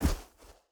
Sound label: footstep burlap sand jump land; Rust